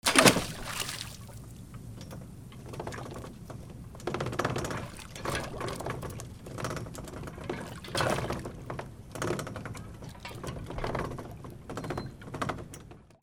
BOATS

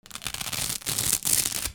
RIPPING

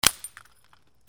BREAKING

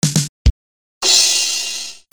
CARTOON

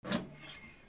BATHROOM

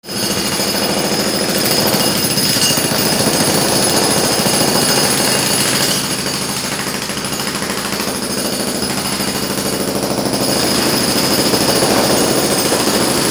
INDUSTRY

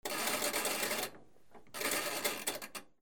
HOUSE

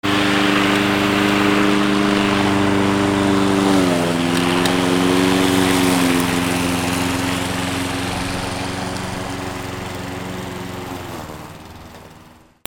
AMBIENCE

